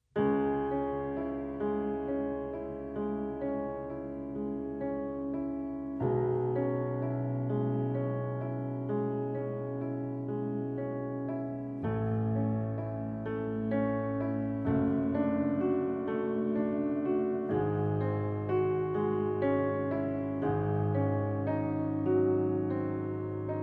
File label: Beethoven sonata in C sharp minor